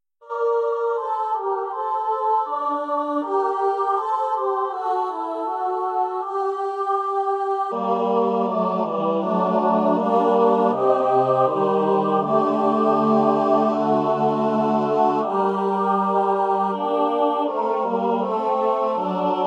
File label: SATB; Renaissance